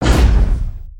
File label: Roll end; Shinobi